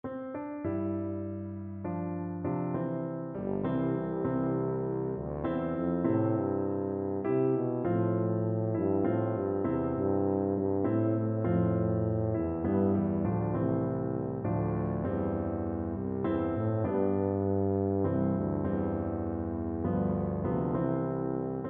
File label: Shenandoah, tuba